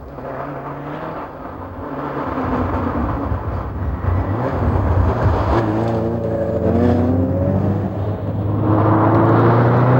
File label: car